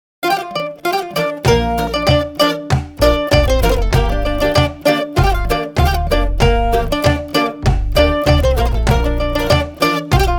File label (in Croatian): Imberlan instrumental